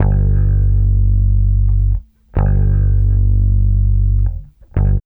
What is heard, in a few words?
BASS, Funky Ass Loops; East West